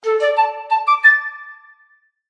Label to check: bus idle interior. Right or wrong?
Wrong